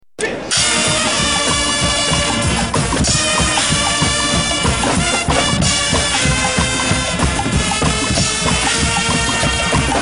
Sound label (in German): guggenmusik